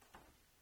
Door Modern; Timelock VR

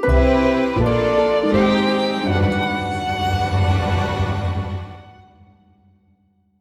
Gameover; vivid knight switch windows gamerip 2021

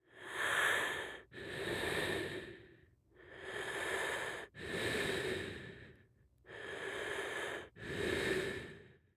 male heavy breathing

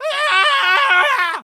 Scream Male

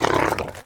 wolf hurt

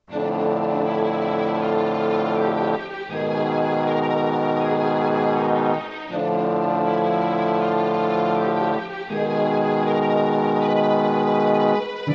Theremin

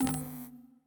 Ui Menu fade out Stereo

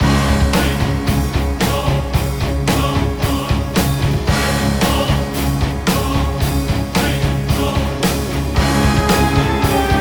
action quirky loop; heroes of the storm full gamerip 2015; towers

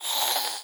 fluke fairy call

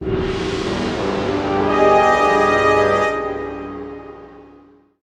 Fail; Unmetal; PS Vita PS4 Switch Windows Xbox One gamerip 2021

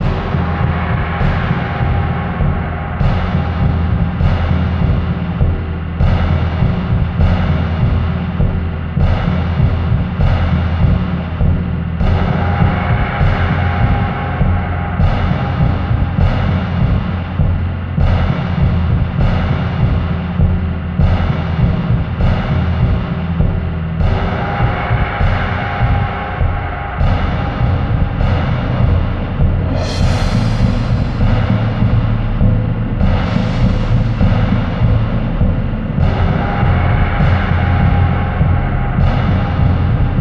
Battle Mongolian Drums; Real Warfare 1242

